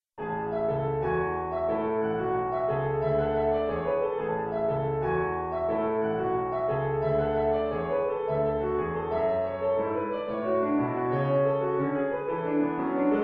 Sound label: Swingtime and Fugue in A major